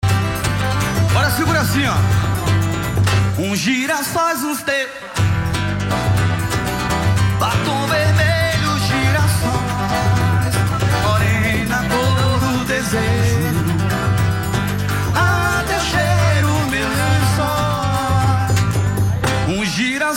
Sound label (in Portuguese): Forró Pé De Serra